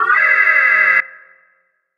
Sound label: eng warpcore alarm; trek